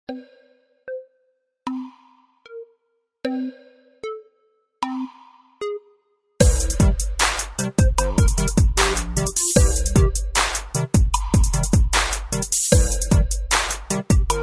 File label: In Game Music (30 Second Countdown); Kahoot